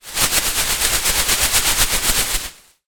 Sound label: plastic bin trash liner bag shake movements; foley; zapsplat